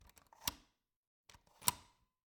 Cabin, front door, deadbolt, lock, latch, thick, open close, hyper; Eiravaein Works; Vaeyan IV